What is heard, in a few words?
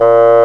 Bassoon; CMI